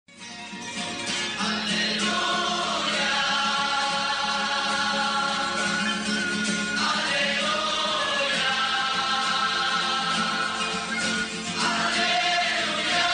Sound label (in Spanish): Aleluya interleccional, Cantos camino neocatecumenal